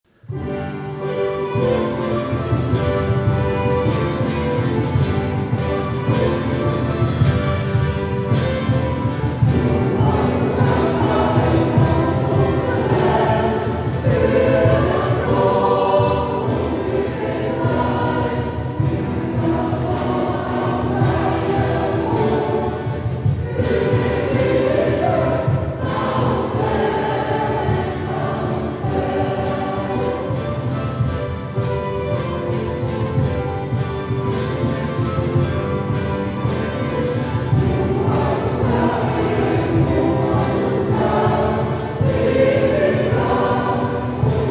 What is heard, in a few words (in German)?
Dankeshymnus, Kirchenchor